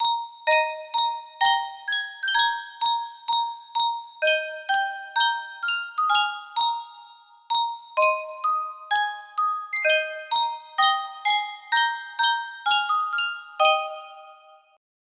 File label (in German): sankyo spieluhr